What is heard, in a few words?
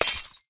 Pop Glass; BTD6